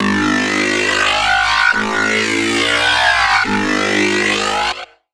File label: Bassline, Dubstep and Trap